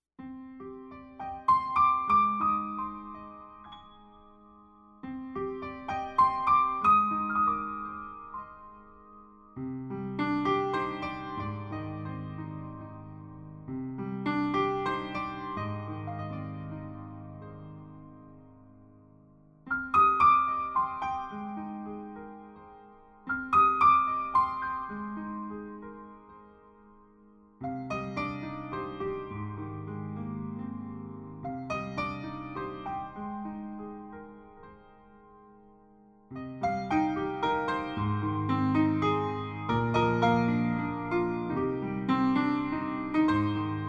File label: rain instrumental